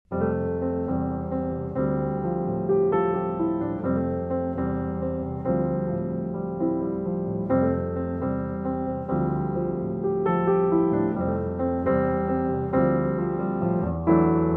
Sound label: Solo Piano